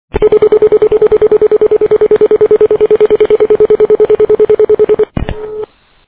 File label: pentakonta after dial